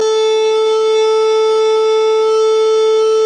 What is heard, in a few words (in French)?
son saxo La3; chapitre 08 Signal sonore frequence niveau sonore